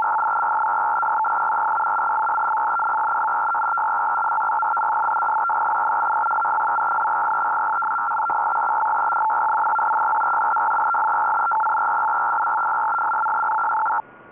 CIS 200 Bd PSEUDO#

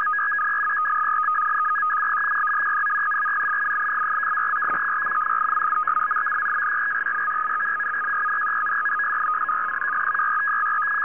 ROU 115-76 TFC; ROU-MIL FEC 115.76 Bd in tfc. condition; FSK-systems

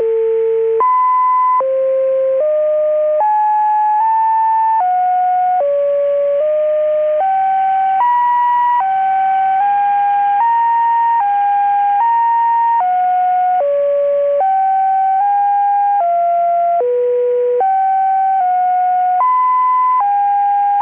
RUSSIAN INTEL MULTITONE SYSTEM (17+1)

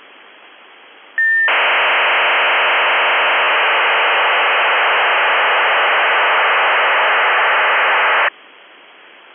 RFSM 2400Bd ARQ600L

